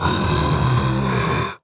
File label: Gorilla; PC Gamer IT CD 41; machines demo